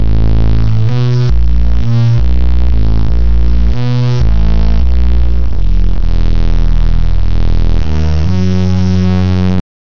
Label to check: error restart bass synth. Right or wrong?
Right